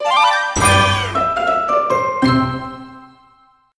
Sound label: Crunchy Finish